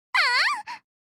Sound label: moaning